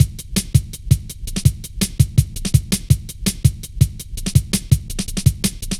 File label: light stepz, drum loops; Zero-G Jungle Warfare 1, 2 & 3